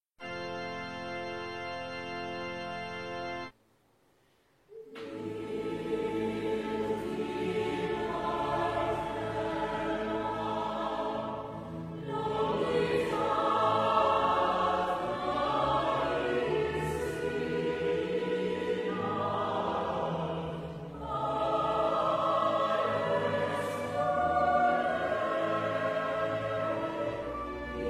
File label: Malta national anthem